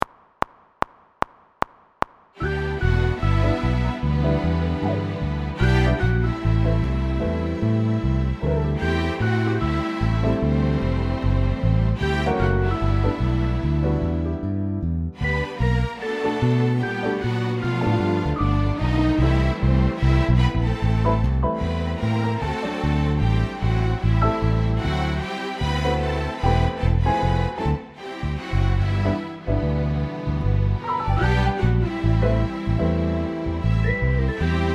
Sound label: backing 1 bar click